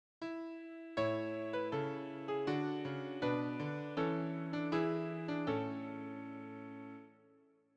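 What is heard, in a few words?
cadence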